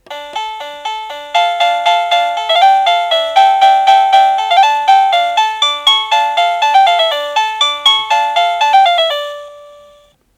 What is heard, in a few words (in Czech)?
melodie